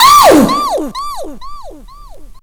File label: vox special